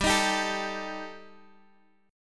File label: G Suspended 2nd Sharp 5th G, Guitar, Open G; chordshape; down